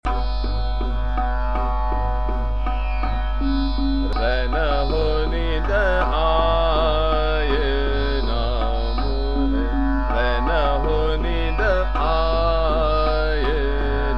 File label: Chota Khyal, Trital; Rain Ho Neend Aaye Na